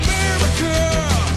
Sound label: fire, weapons